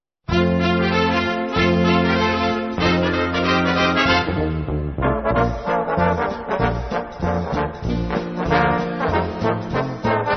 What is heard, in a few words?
happy trombones